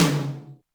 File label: Yamaha RM 50 Toms